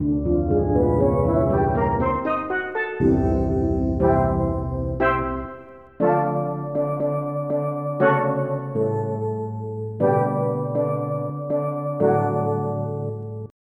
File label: Steel Drums; EverQuest